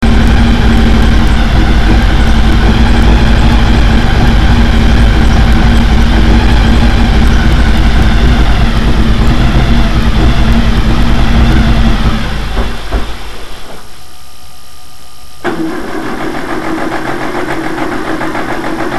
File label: running rough, Healey engine sounds